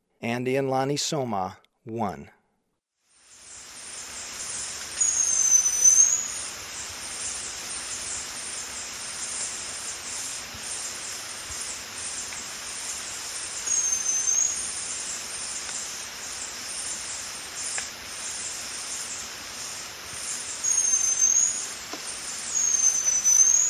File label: Andean Laniisoma, Ecuador; S. America